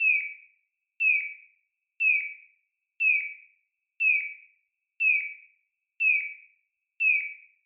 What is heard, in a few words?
guiding crosswalk